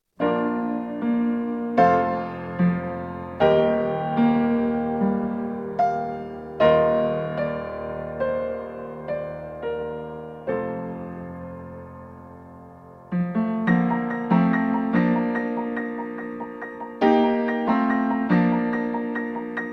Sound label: Dancing Raindrops